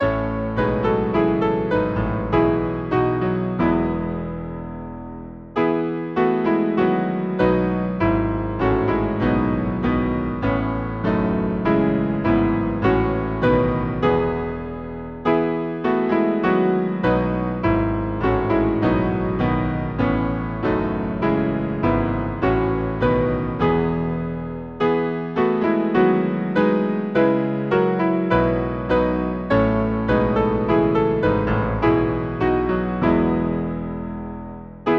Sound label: Hymn, piano; Judge eternal throned in splendor; Komm, o komm